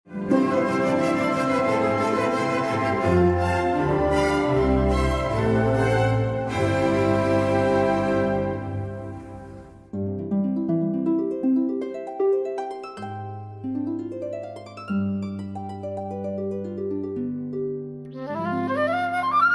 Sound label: cadenzas for flute and harp; mozarts flute and harp concerto; movement 3